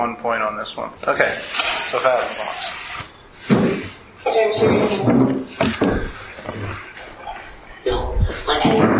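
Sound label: 2nd Floor Party Room Phasma; 1810 tavern investigation return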